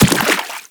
Run Water, Footsteps; Warframe; Teshin

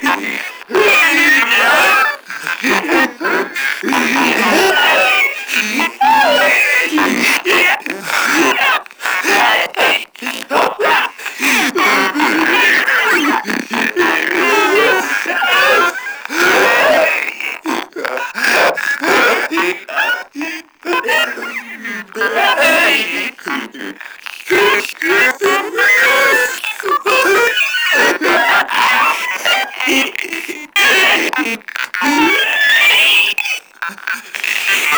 Proto Infested Runner Breathe Loop; Warframe

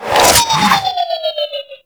Grenade Proj By; Prime Grineer Trapper; Warframe; Tau

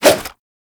Nunchaku End Whoosh; Warframe; Tenno

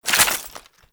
Bayonet Melee Whoosh Gear Accent; Warframe; Tenno

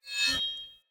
Spear Whoosh Accent; Weapons; Player

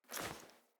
Foley Walk Default, Default Clothing; Player

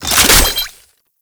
Shotgun Reload; Tenno; Alchemist Shotgun Deluxe

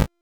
Entangle Projectile Explosion; Warframe; Oraxia

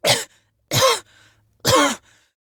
Coughing; Pacifist; Warframe; Evacuation

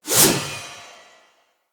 Spear Throw; Weapons; Player

